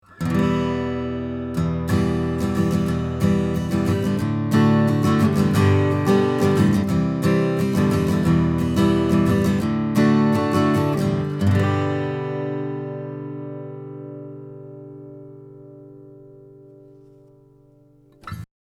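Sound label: Acoustic Guitar, 40 cm, 15 Fret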